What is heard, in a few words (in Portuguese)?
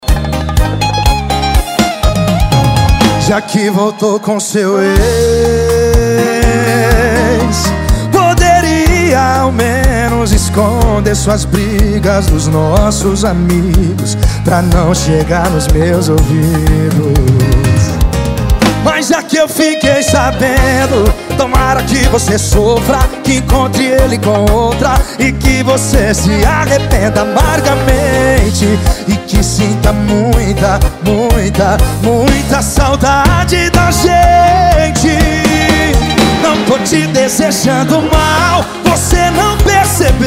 sertanejo